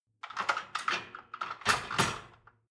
dishwasher cutlery basket replace

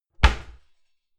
cupboard close dishes ringing hard

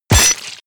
sword impact gore

